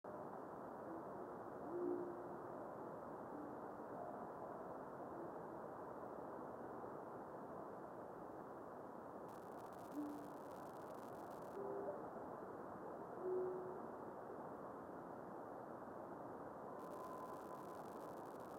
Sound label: Owl visitation